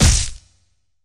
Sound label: blade; weapons